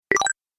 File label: Pickup Points, video game sound effects